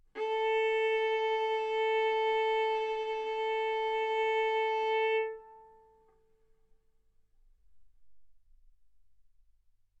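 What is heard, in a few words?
Violin arco mf A string A4 B4 stereo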